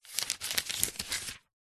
household book phone directory yellow page turn; zapsplat

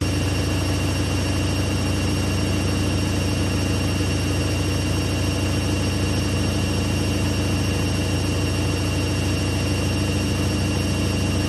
washer washing machine spin fast seamless loop; PMSFX

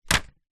foley paper airplane land hit surface

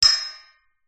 impacts metal gate pole hit with small stone

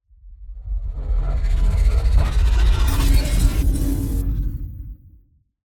Just Transitions SciFi; soundbits

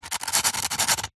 sand paper sheets x2 rub together fast

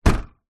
car boot trunk door close external toyota corolla

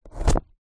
food carrot slice knife chopping board noise; zapsplat

